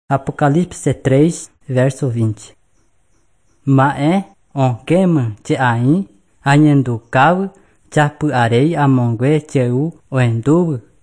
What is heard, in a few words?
songs guarani mbya; bible